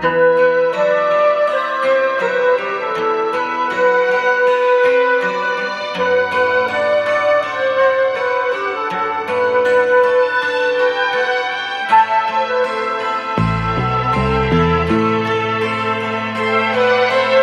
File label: flute windswept hair